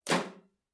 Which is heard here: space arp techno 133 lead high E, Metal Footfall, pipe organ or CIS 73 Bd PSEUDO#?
Metal Footfall